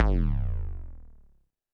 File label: Roland TD-20 Percussion